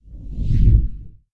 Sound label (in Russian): zvuki s effektom peremeshcheniia videokamery